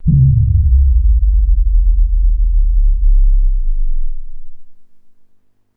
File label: VFX2 Sub